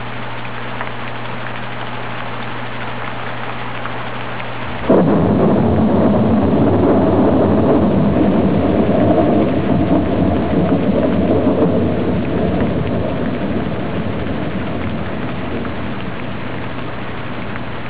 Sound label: Storm